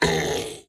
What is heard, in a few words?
Demon Death